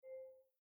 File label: Hover Cursor